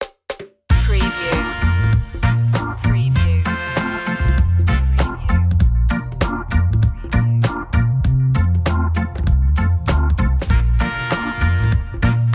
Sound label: reggae party